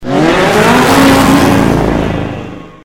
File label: attackbike engine start; nod